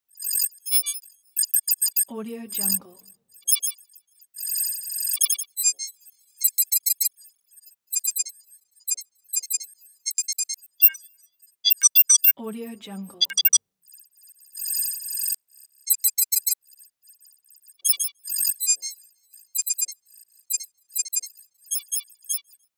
hitech cybernetic device

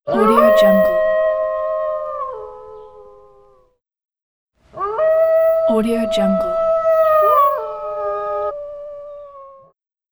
wolves

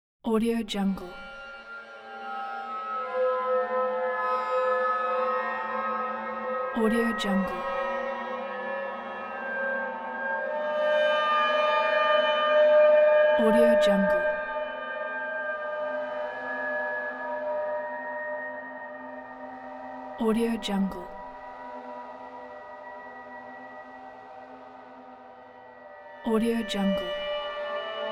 horror atmosphere

